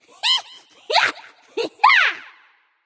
yay; smite; voicepack; scylla

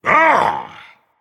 understand ah, dragon; fafnir

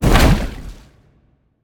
Cast Ice; smite; merlin

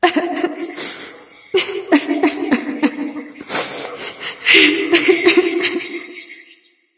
boohoo light; smite; voicepack; hel

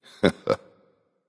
hehheh; smite; olorun

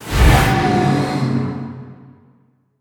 ghost excorsize; smite; zhongkui

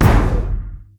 impact; smite; Apollo

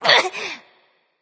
ouch; smite; serqet

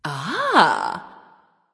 discover; smite; arachne

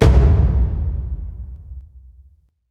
taiko low; smite; raijin

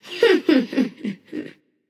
heh heh; smite; merlin

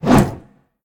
swing sword; smite; Ares

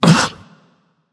notice; smite; arachne

